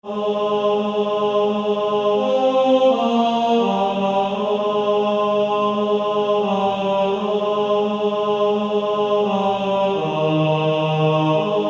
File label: Male Choir T2; Angels We have Heard on High